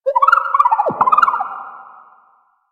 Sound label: Water Creature, Drifter Camp Valley ambience; One Offs; Warframe; Quests; The New War